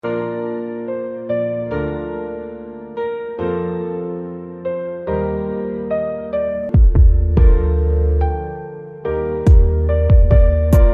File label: nature background music